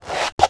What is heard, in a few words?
griptape; Tony Hawk's Project 8; XBOX; bedroom